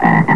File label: Docked; Frog Xing 1.1